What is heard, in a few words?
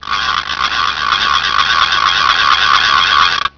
noisemaker